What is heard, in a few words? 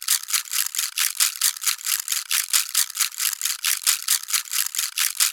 ETHNO PERCUSSION 90; ethno2